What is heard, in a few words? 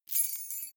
key ring large fly tinkle; objekte; schluessel